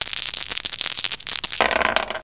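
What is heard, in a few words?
dice roll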